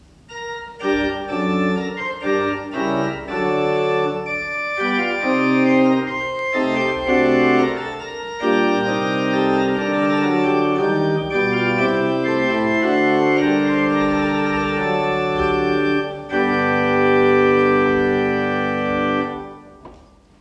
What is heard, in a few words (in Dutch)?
combinatie, orgel; assen